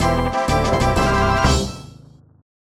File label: Level Complete fanfare; Seven Seas; Windows